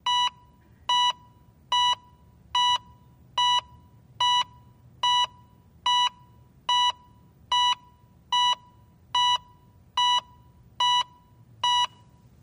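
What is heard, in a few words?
Heart Monitor Steady, heartbeats ekg; Heart Monitor Steady Beeps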